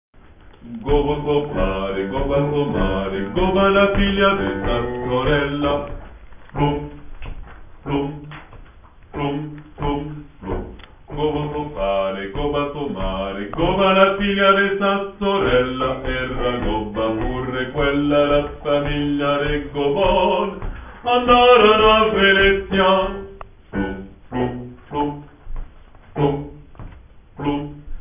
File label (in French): Basses; Gobbo so pare